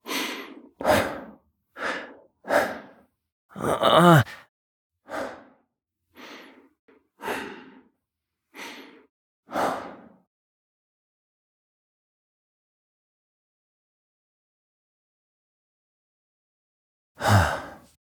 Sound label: Breaths; Amir; Warframe; NineteenNinetyNine; Stage12PartyOfALifetime